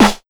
RUN 140 SD

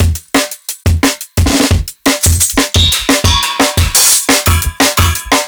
Live Jungle Drum Break, 175; JungleBreaks24

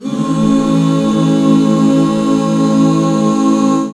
HAL A, BACK CHORDS, Roland; Sound & Vision - Gigapack II CD 1

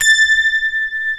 ROCK KIT

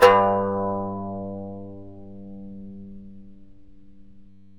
KANUN G1

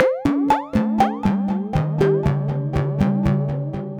Pike Stick, FM Radical Manipulations; Sonic Foundry; The Electronic Music Manuscript Collection

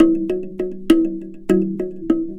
Box Drum Ceremony, Worldly Rhythms; Sonic Foundry (Sony Creative Software); Ma Ja Le Ethereal Textures

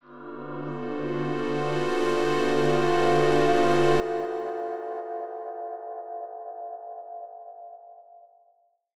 Synth Sting; musicradar

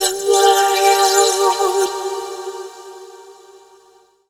VOICE FX, Dance Vocals

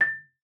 MAR A6, MARIMBA; Xsample VOL-4

